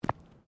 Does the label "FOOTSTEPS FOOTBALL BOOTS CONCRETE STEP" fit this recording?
Yes